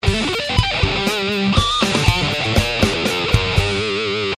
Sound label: Heavy SD1 UniVibe JCM900